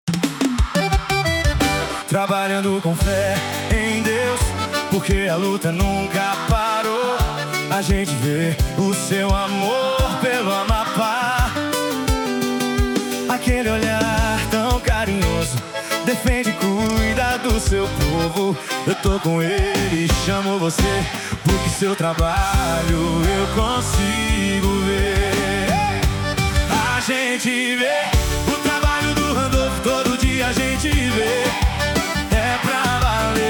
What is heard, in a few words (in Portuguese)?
jingle; randolfe